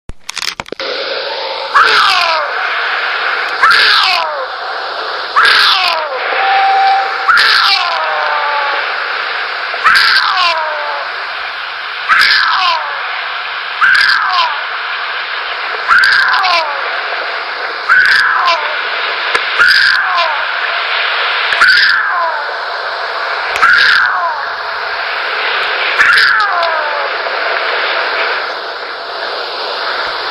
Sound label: Lion